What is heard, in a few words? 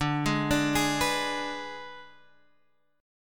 Ab Diminished D, Guitar